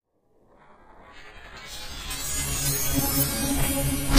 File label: Arpeggiated Tone Up, stinger